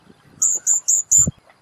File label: Common Stonechat calls Ipoh Perak Malaysia